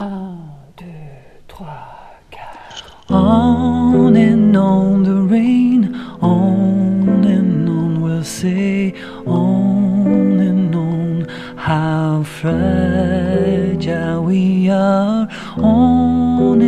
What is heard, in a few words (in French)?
Soprane chante sur piano